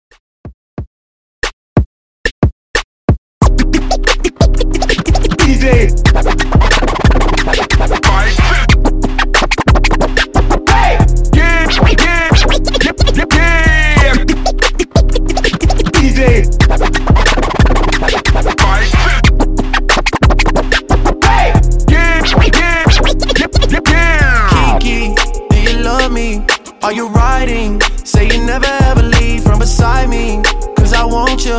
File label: Funk Scratch Redrum 91Bpm